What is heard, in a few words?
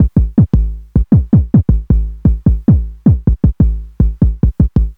909 Kick